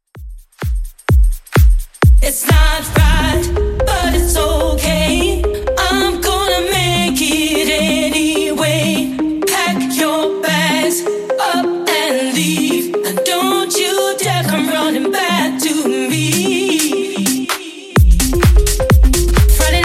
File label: House DJ Friendly Edit 128bpm